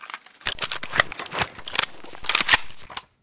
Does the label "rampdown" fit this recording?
No